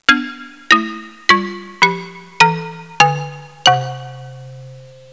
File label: xylo, music; Music Game